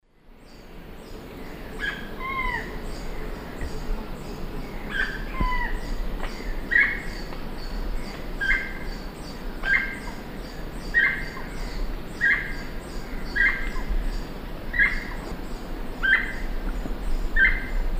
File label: Piaya melanogaster, call and song; S. America; Eastern Lowlands